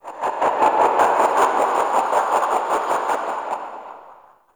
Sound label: Crackly Birds; Warframe; Shadowgrapher